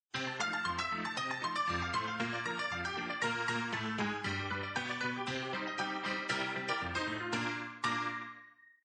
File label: Ragtime player piano